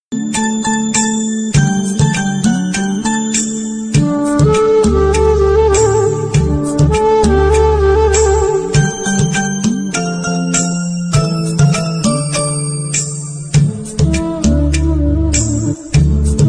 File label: Flute Bgm Ringtone